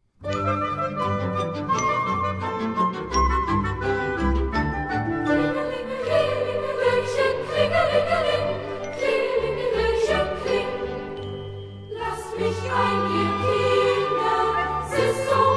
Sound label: Kinderchor